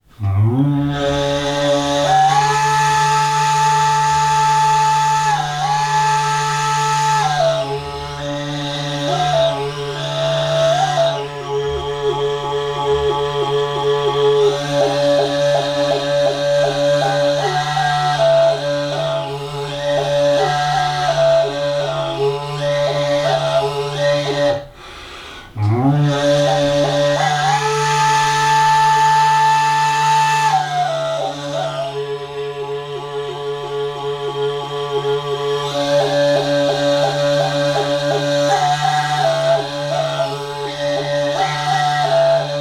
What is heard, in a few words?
SOUNDSCAPE; Besbogda; Enbek